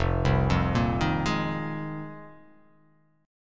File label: F Minor 11th, Fm11 Chord; arpeggiated; 1,3,3,3,3,3,x,x; JGuitar